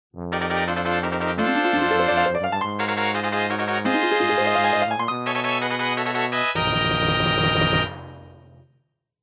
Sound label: Clear Fanfare; Four Swords Adventures